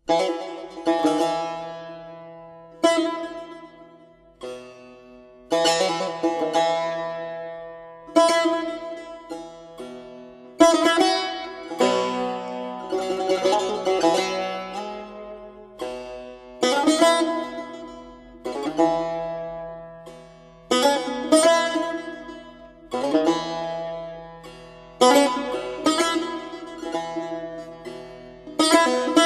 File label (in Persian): Saz